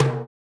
Roland Juno D Perc Menu Kit

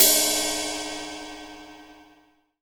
O Z RIDE

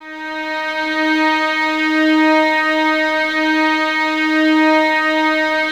VS D#4, Violins; Miroslav Vitous; 11 Violins

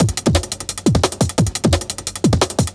DNB BREAK; m8 breaks

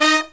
HIGH HIT

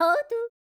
YODEL, World Voices; ILIO; Vocal Planet

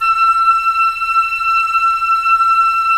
Flute; Roland LA Composer; Roland - LA Composer vol. 4; 90 s Sample CDs

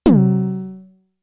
Tom, Toms; Fricke Schlagzwerg; Drum Machines

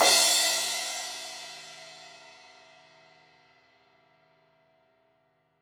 Crash 16in edge, Cymbals; Stereo; Deeply Sampled Drums

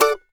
MANDO D MAJ L; Sampleheads; Whole Lotta Country 1 & 2

